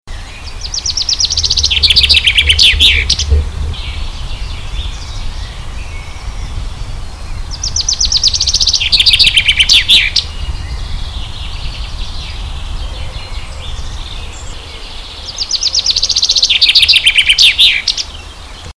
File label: fringilla coelebs, vogel; hecke; biologie